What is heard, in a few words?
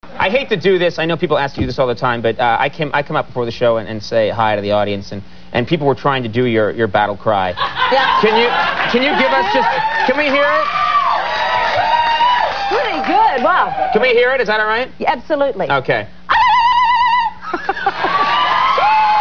battlecry